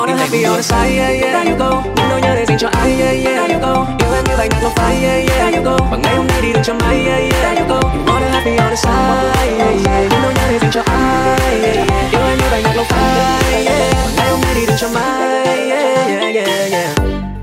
Nhac Chuong Remix

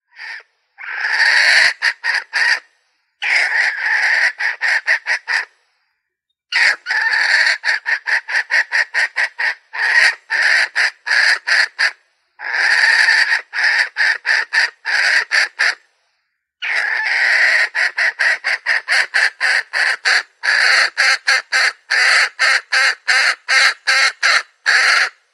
Tieng Ga Mai; moizing

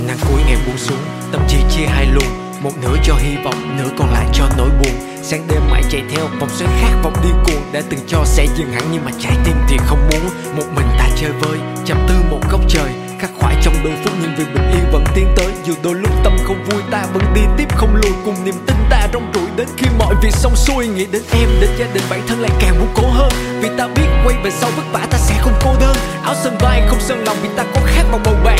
Nhac Chuong (Rap); nhac tre

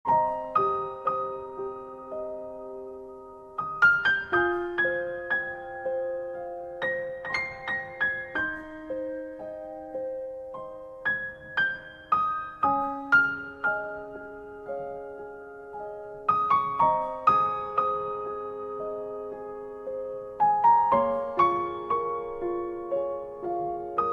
piano khong loi; nhac chuong

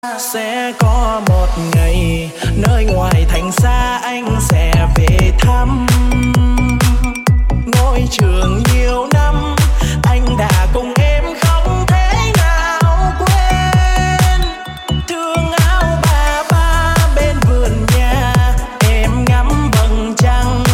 nhac chuong remix

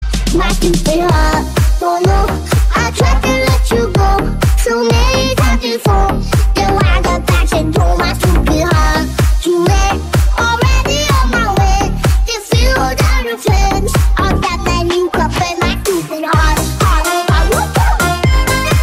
nhac chuong remix kids version

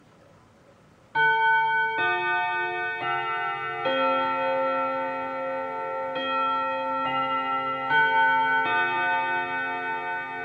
nhac chuong dong ho

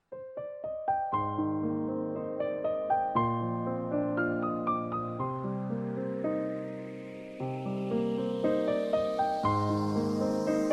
Nhac Chuong Piano Remix; Tiktok

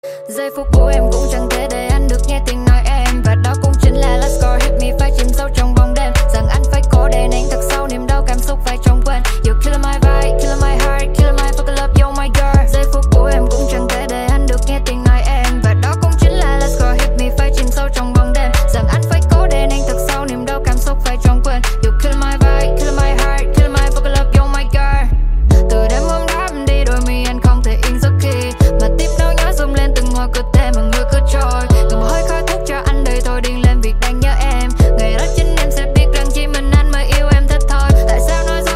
nhac chuong missed call

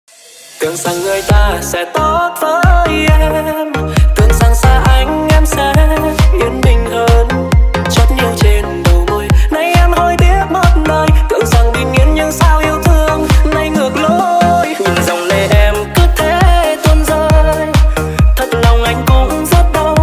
nhac chuong remix

